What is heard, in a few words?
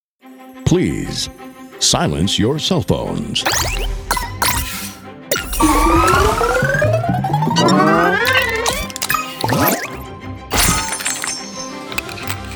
puzzle game sound fx